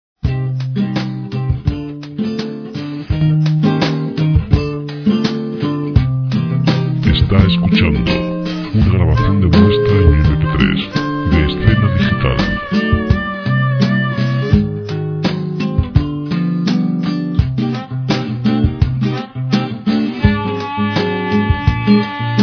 84 C lent guitarr

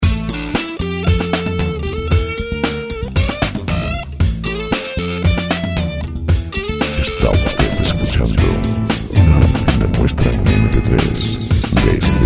rock

